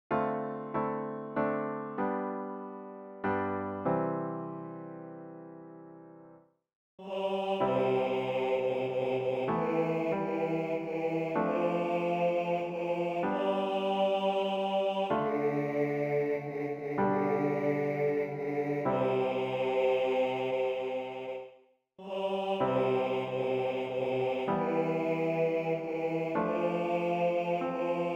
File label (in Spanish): bajo; Bajo 2; Cantata para SATB, Solistas y Narración; (Juan 13)